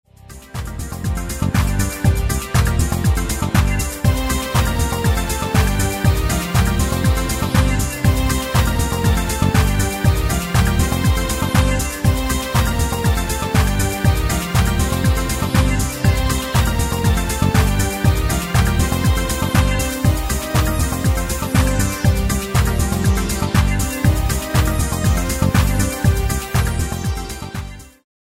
indust

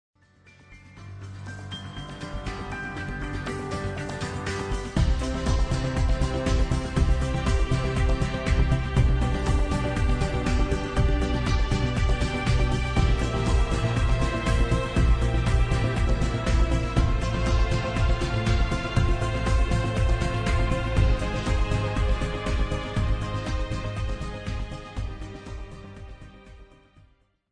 industrial orchestra mini; tinellimusica

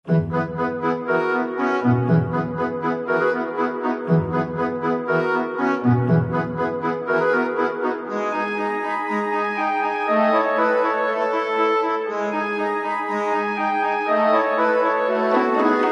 orch

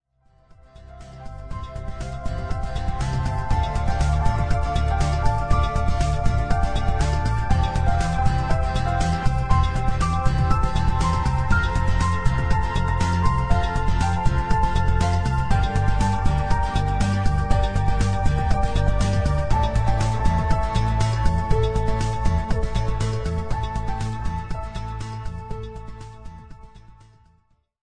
industrial; tinellimusica

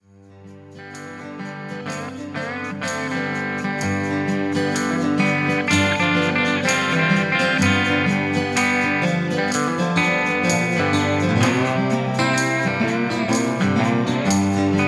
country blues

